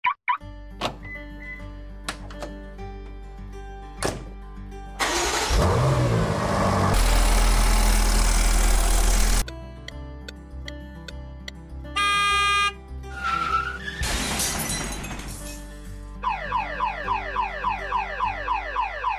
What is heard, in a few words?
Car